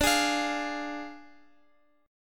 D Diminished D, Guitar, Open F; Ddim strummed; x,x,x,9,11,12

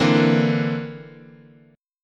D 7th Suspended 2nd Sharp 5th, guitar chord

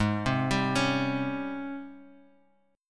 G sharp Major 11th, Guitar, Drop D; 6,3,5,6,x,x

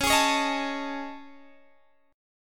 A Major 7th Flat 5th

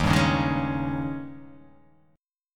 D sharp 11th D sharp

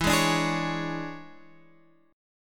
E 13th

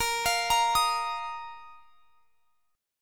A sharp Suspended 4th; A#sus4 arpeggiated; x,x,20,22,23,23

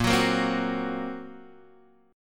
A sharp Suspended 2nd Sharp 5th A sharp; strummed; x,1,4,3,1,2; JGuitar

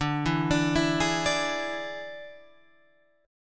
D Suspended 2nd Flat 5th D Guitar Open G

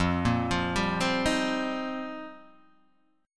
F 7th Suspended 2nd Suspended 4th, Guitar Open G

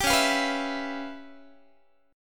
C sharp 7th Sharp 9th Flat 5th C sharp Guitar; strummed; C#7#9b5; JGuitar

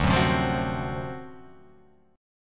D 7th Sharp 9th D down Guitar Open F; D7#9 strummed

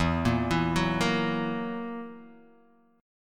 E Suspended 2nd Flat 5th E down Guitar Open D

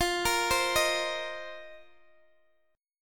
F 7th Suspended 4th, Guitar, DADGAD; x,x,15,15,15,13

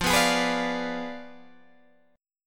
F Diminished 7th F down Guitar Open G

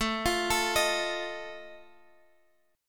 F 7th A

